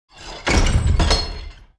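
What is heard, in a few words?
door heavy close; The Elder Scrolls III - Morrowind; Morrowind GOTY GOG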